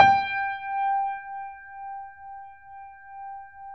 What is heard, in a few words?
G 4, Keyboards Acoustic, GRANDP ST; USB Soundscan vol.17